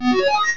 Sharpen; battle anims; PokeRogue

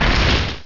Flame Charge; battle anims; PokeRogue

